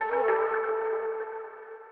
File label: ethno, 125, A